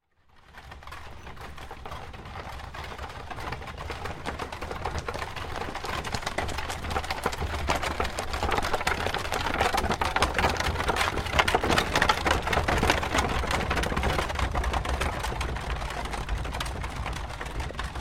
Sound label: Horse Drawn Carriage By Slow; Horses Carriages and Traps; Hollywood Edge Historical Series